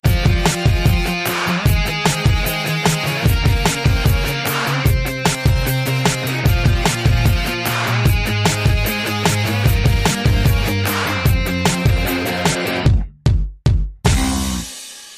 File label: major moves